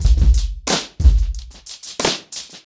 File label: 90BPM, acoustic drums; The Roots Drumloops